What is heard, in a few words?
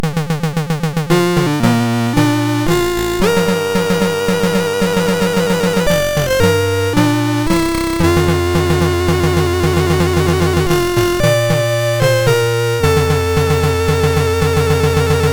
National Anthem MOS6581